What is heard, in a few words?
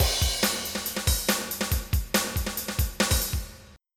break beat; flstudio502